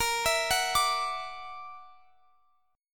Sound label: Gb Augmented 7th Bb